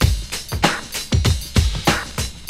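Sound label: 97 street; AMG; Now CD-ROM (Roland); BLACKtoBLACK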